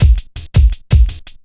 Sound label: 165, Drum Loops, Drum & Bass; 90 s Sample CDs; Total Drum&Bass